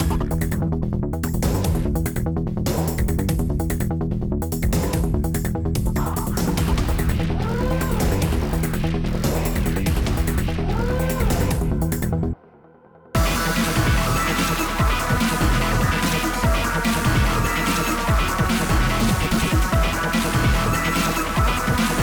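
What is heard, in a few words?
Loop Battle Boss; Scrap Rush; Windows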